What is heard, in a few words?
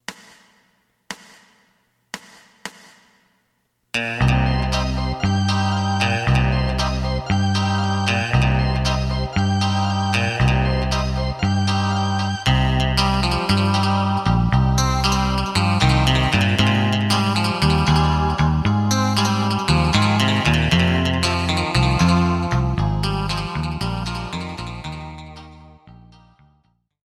drum